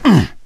melee swing, gambler, survivor voice; source engine; left 4 dead 2

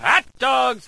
baseball critical stop; sound schemes

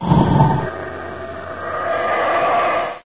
ichy die; Source Engine; bshift

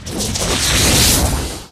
whoosh large, near miss

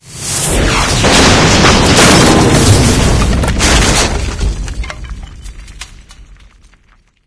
house explosion; video games; ambient; source engine; portal2; outland

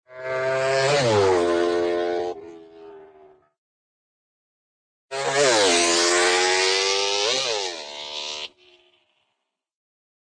motorcycle; hannah barbera cartoon sound fx; motor mouse

